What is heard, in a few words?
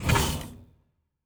Door, Sci-Fi Sounds